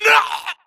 grunt death neck; Titanfall 2

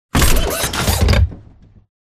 dodge left; Titanfall 2

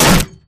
bullet heavyballistic titan; Titanfall 2

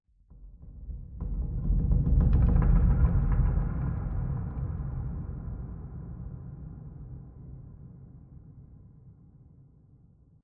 Metal Stress; Boomtown; Sideways Town; Titanfall 2

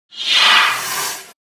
Drone Deploy Throw; Titanfall 2

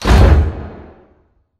pod door sm verb open stop; Titanfall 2

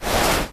Grunt Mvmt FOL Soft Slow Cloth; Titanfall 2

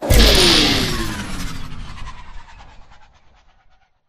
drone powerdown; Titanfall 2

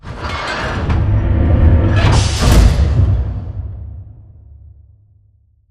Conveyor Plate Slide Lock; Titanfall 2; Boomtown

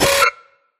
Screen Glitch Short; Boomtown; Titanfall 2

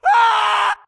grunt death crush; Titanfall 2

